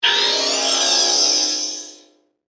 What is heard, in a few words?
Scanner Beam Device, Scifi; Science, Fiction; Tech; BlastWave